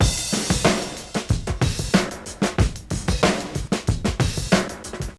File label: DRM CHOP 93; AMG Gota Yashiki Groove Activator